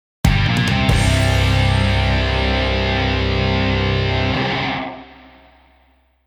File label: guitar highlight